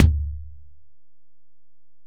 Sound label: Ac BD, Alesis DM-PRO; 300 Drum Machines